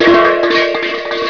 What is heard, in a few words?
HUBCAP, 碰撞声音素材